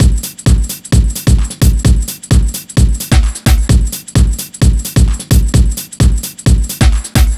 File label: House Bumpy 130 bpm, Drums; Loops; Ableton Live 12 Lite; Core Library